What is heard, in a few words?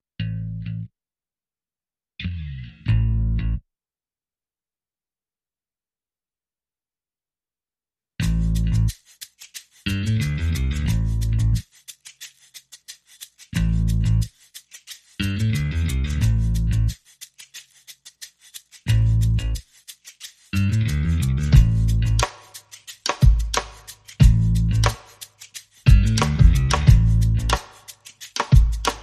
Drums and Bass Only